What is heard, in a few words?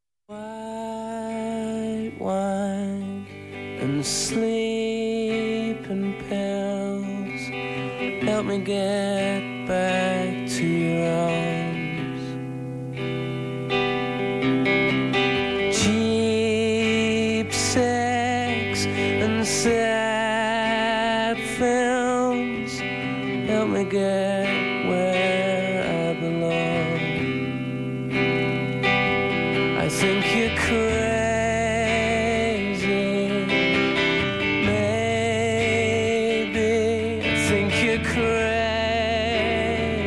acoustic